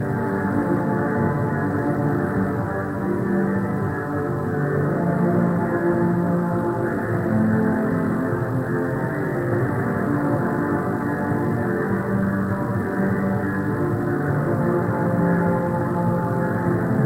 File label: Dance (Broken); Hollow Dance